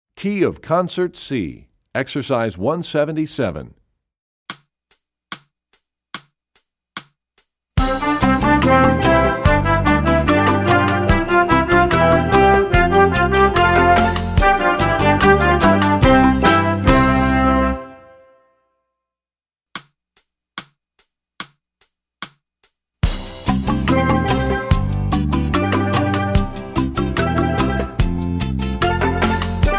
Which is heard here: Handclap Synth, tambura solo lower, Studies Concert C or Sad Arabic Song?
Studies Concert C